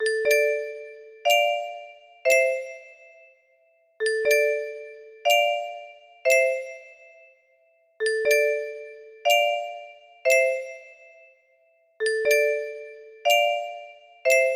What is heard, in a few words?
bass, music box